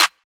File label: Clap